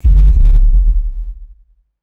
deep synthetic low frequency impact; designed

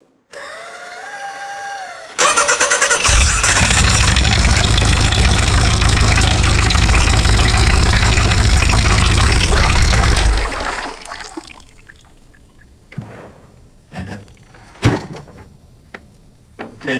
vintage car interior start and shut off; vehicles

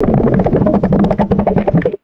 rapid underwater machine gun burst with bubbles; guns

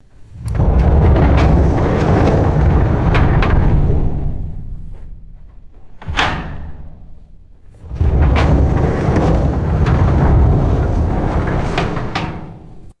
heavy metal rolling bulkhead door; boats

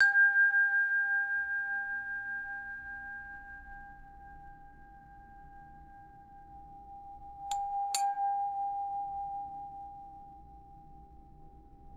resonant crystal wine glass taps

